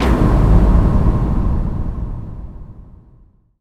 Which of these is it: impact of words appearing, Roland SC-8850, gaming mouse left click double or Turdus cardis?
impact of words appearing